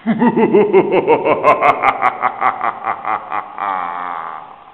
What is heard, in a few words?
wicked laugh; halloween